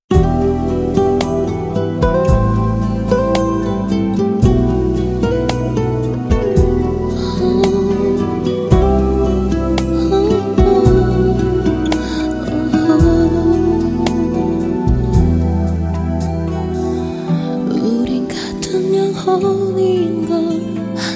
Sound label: sad korean song